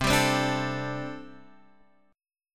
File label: C 6th Suspended 2nd C